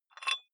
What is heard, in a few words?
dumbbell thread take off small weight quick; sport freizeit